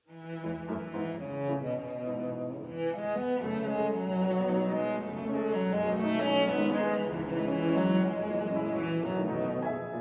Cello Sonata in E Minor, Allegro Comodo

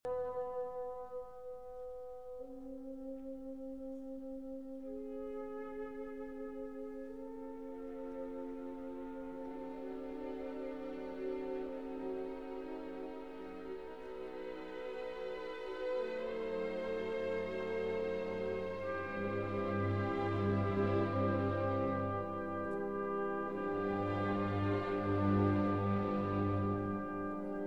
Violin Concerto in E Minor; Op. 64, MWV O 14; Mendelssohn

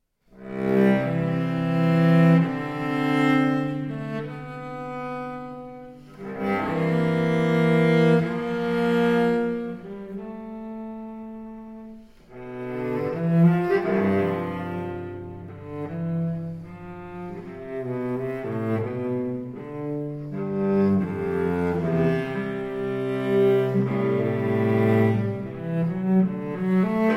Cello Suite in C Major, Sarabande; Cello Suite No. 3; BWV 1009 IV.

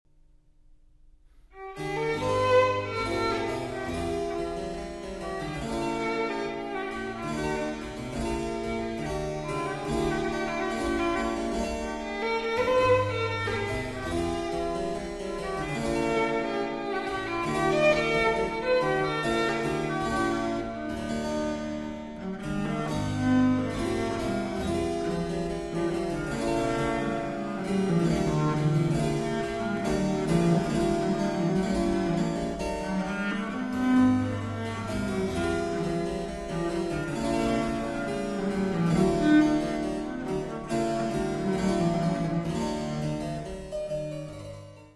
Violin Sonata in E Major, Rondeau; 12 Violin Sonatas, Livre 2, Sonata 12

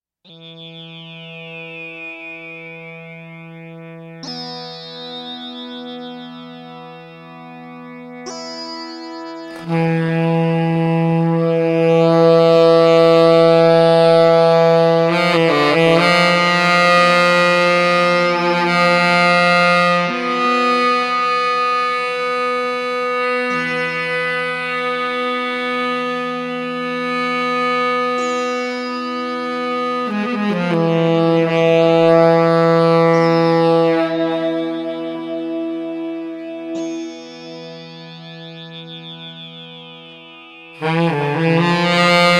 Sax

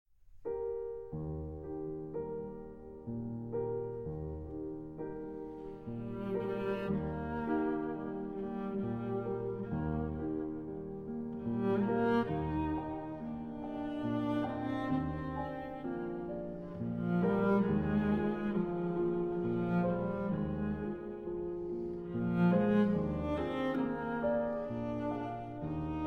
Arr. For Cello and Piano; Wiegenlied, Op. 49, No. 4

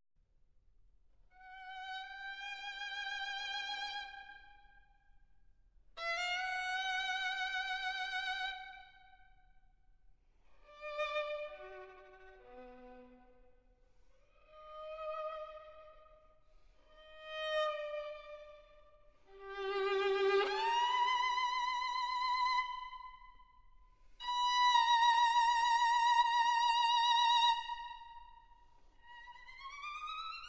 Concerto For Violin and Orchestra; Gubaidulina In Tempus Praesens

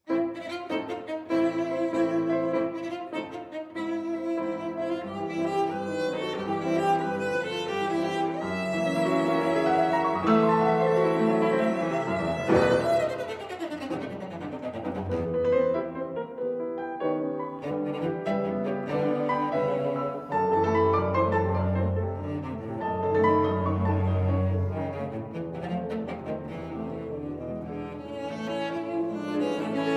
Cello Sonata in E Minor, Scherzo. Allegro

